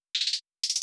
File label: Hat Rolls